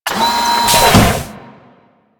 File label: Tenno Animal Foundry Working To Sleep, Machines; Warframe; Liset; Hub